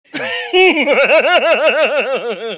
risada malandra

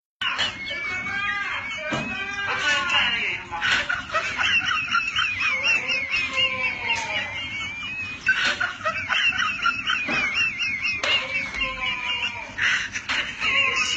risada com catarra

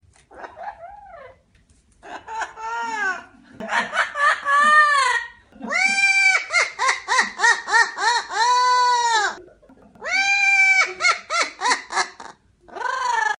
risada maligna

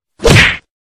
carimbo

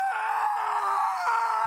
gritando

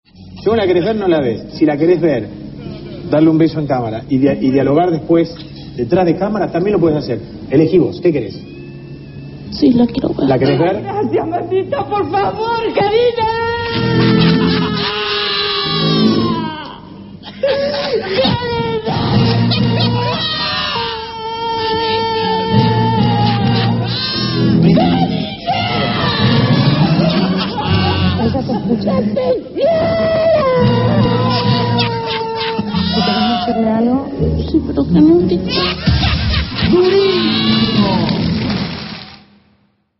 grito

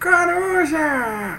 coruja

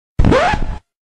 disco arranhado

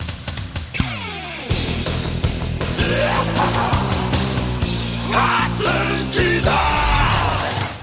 vinheta metaleira; atlantida

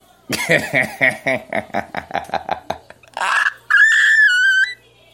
gaitada risada

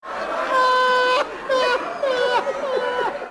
risada

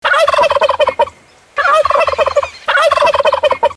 peru glugluglu de boas vindas

